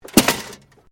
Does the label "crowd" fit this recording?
No